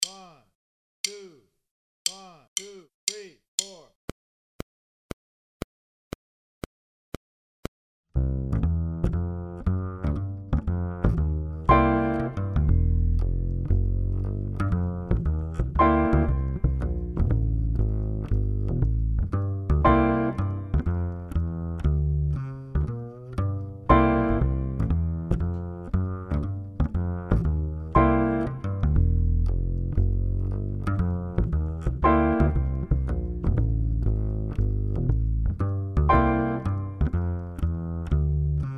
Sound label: Swing only 2bars, Drum Fills; Essential Drum Fills; Erskine